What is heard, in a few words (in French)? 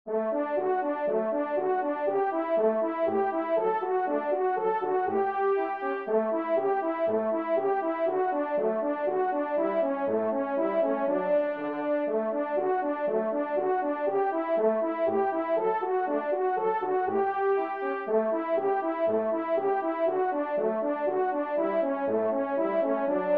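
duo, ensemble, trompe cors; Kling; duo cors n 10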